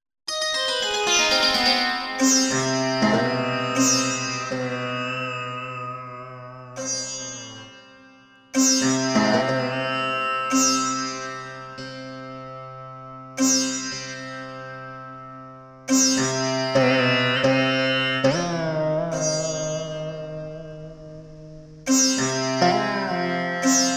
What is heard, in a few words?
surbahar chandrakauns